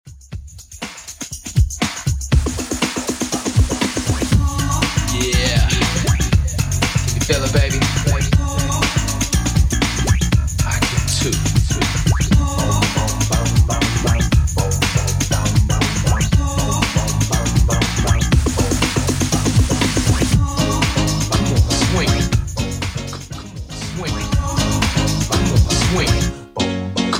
90s Redrum 120bpm

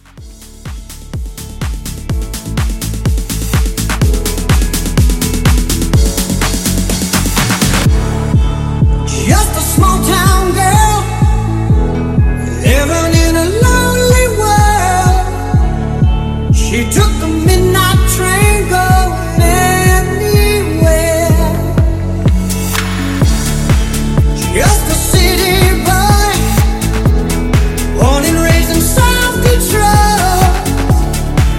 Mashup 125

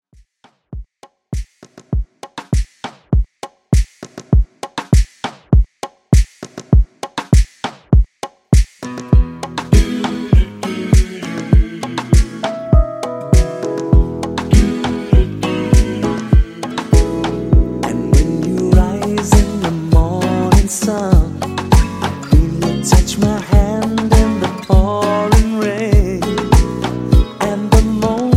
Extended Redrum 100Bpm

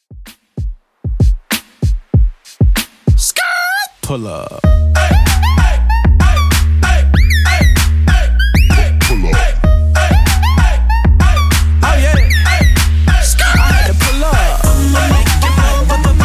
96bpm

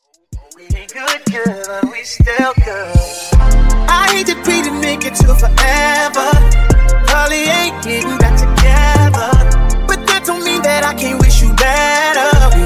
Funk Uptempo Edit 80 Bpm

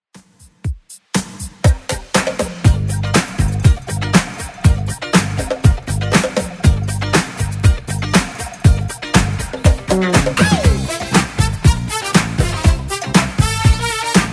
80s 120bpm; Redrum

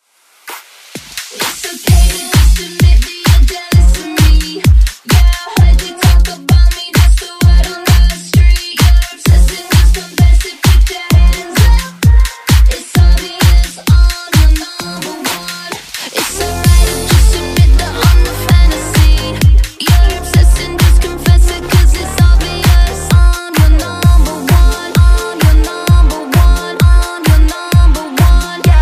Dance Redrum

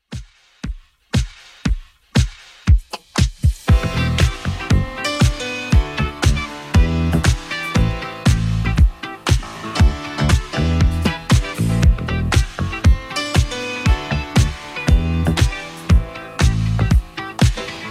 80s 118 bpm

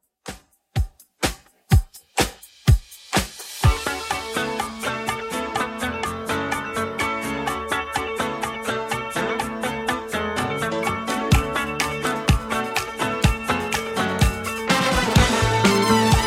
70s Redrum 125bpm

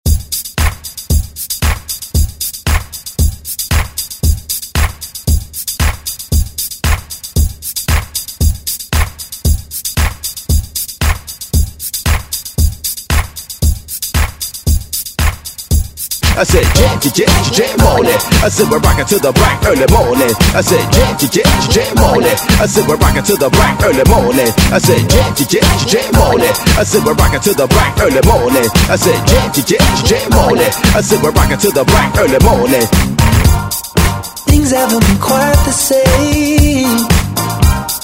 Pop Redrum

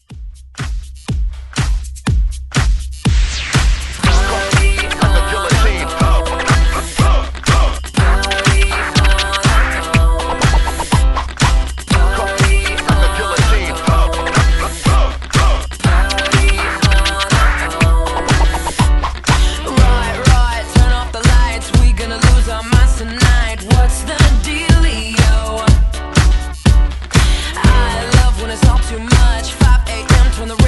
Hype Scratch Re Drum 122 Bpm; DJDOLLS

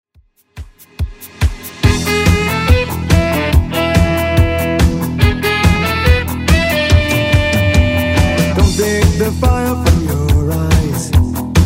80s Redrum 142bpm

